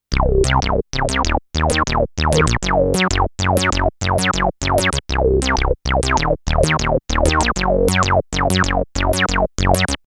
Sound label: Reso Bass SEM